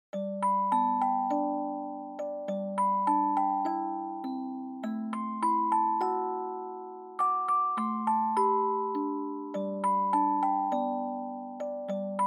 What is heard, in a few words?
Dry Fast; bgm; Jingle Bells